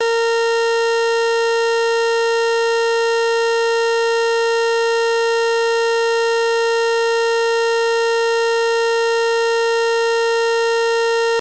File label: steady horn; DP3000